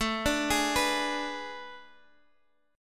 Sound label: A Major 7th Suspended 2nd Suspended 4th; chord